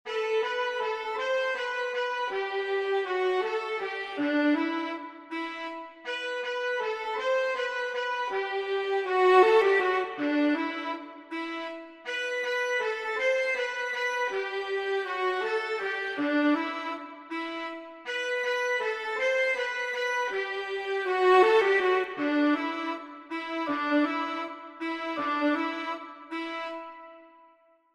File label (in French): focus Violon; Les Valkyries